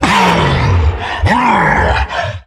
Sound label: pulverize cast; weapons; dota; primal beast